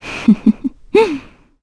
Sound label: Laugh, kr; Valance; kingsraid